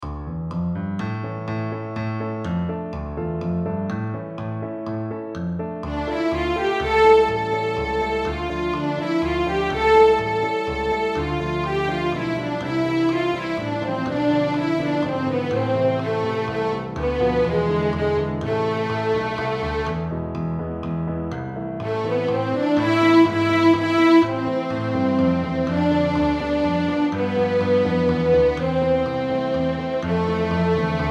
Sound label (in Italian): Danza, danza, D min; Teaching